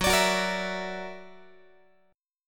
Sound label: F sharp Augmented 7th F sharp Guitar; F#+7; x,14,17,16,14,15; strummed